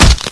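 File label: crowbar hit body, weapons; video game; source engine; hl1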